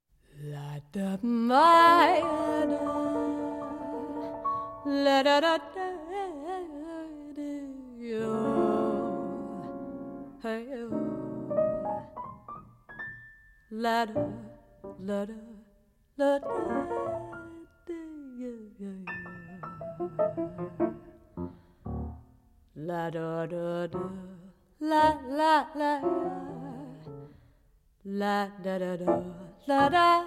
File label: Tango